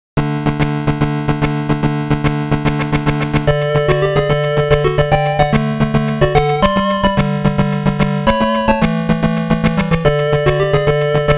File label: All Clear; Darius 30th Anniversary Box